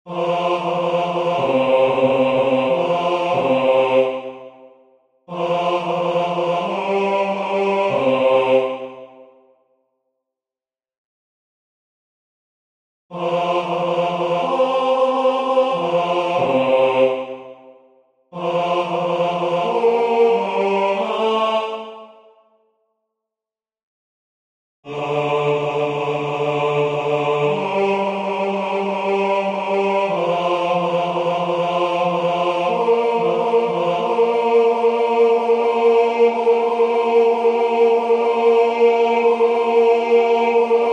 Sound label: Dyby byla Kosa nabrosena Bas; A.Dvorak